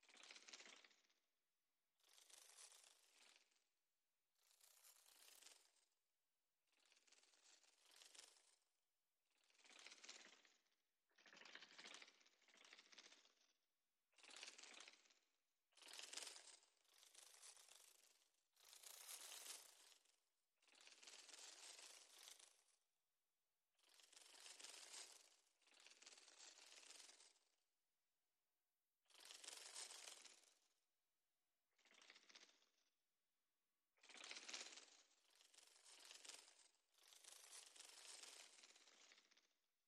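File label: butterfly sound; babochka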